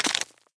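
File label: movement knuckle; Smite; Cabrakan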